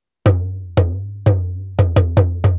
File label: ETHNO EXTRA 90; ethno2